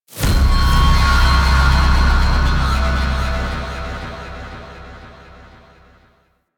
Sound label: Sentient Ramsled Breach Call; Warframe; DE; Vehicles; RailJack; RailJackEnemyShips; Sentient Ramsled Agent